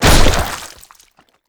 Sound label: body explode; nightmare bear; sand king caustic